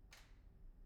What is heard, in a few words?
pendrop; IEEE AASP